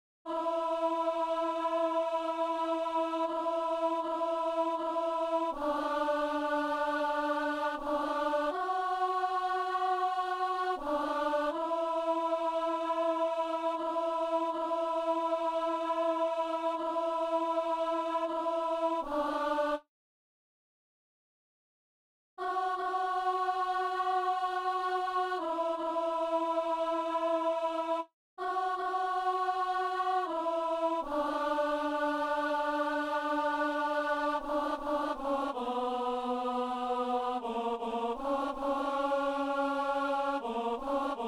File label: alto